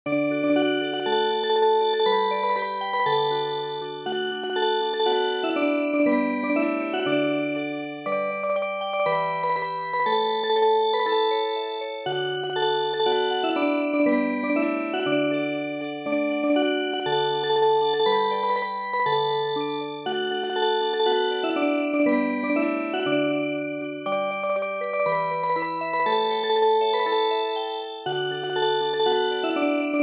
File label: Music Box